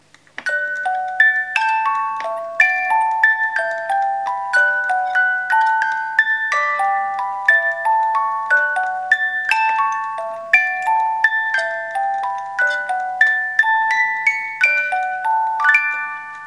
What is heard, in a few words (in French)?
boite a musique